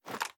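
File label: sniper reload empty fast arm; gmod; iw8; xmike109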